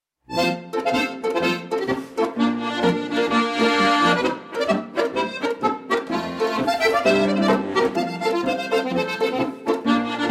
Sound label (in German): Polka